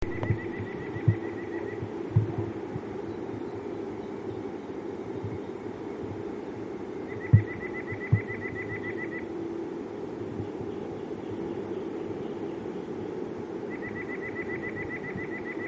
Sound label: nuthatch